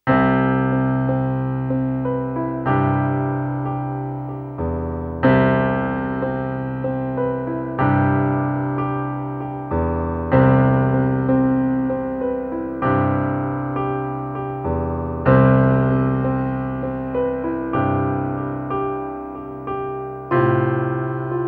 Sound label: solo piano